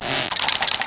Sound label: iceegg die